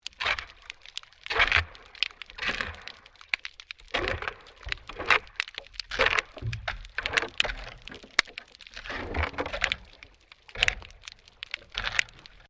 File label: MAINTAINENCE WORK UNDERWATER SHOVEL SCRAPING